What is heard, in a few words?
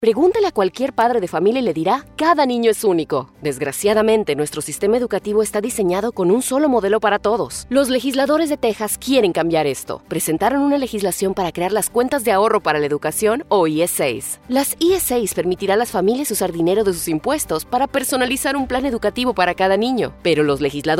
radio music; LIBRE TX ESA